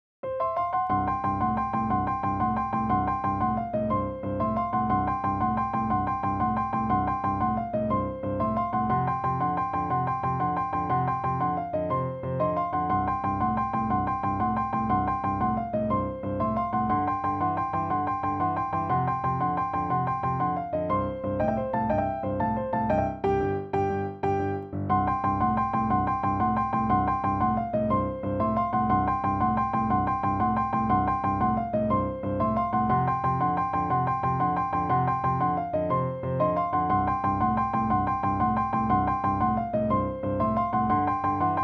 Blues Exercise - Hammered Fourths

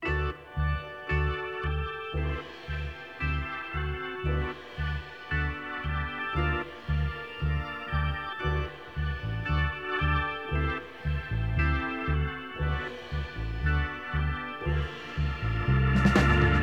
Organ Intro

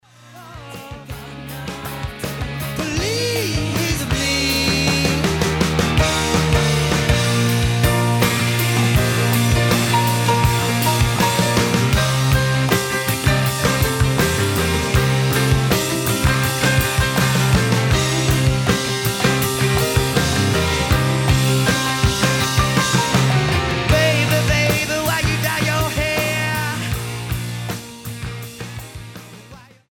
piano solo

